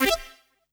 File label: Notifications and Buttons